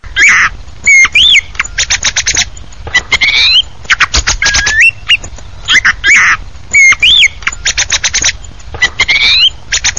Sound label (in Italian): tordo